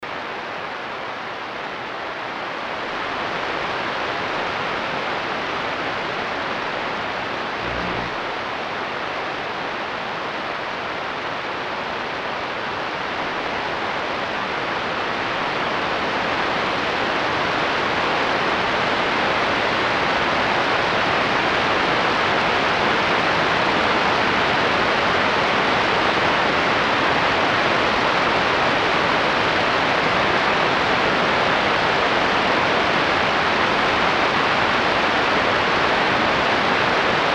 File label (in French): bruit solaire